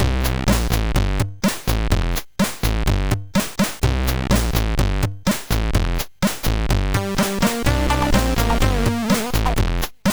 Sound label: sid, MOS6581R3